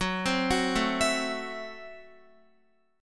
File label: F sharp Minor 7th Flat 5th F sharp Guitar; arpeggiated; x,9,10,11,9,11